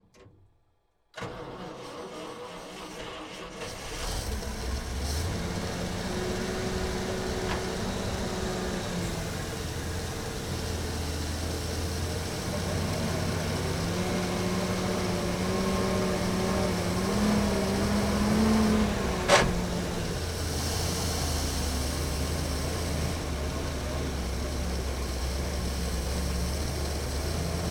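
BRDM Onboard Start Medium Drive Stop Reverse Drive Stop Off Interior Above Engine Right; BRDM-2 APC 1964; DPA4061; Pole Position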